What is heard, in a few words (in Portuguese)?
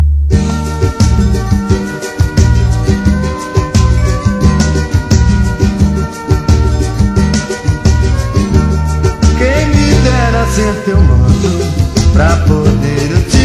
Musica